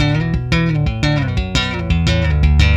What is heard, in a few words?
Electric Bass